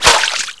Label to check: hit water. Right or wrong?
Right